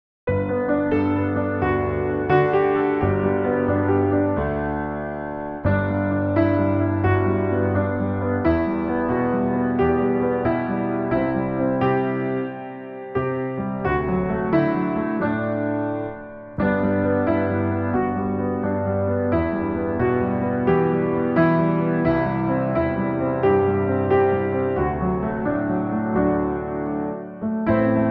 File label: Pour A Little Love 88 BPM in G No Vox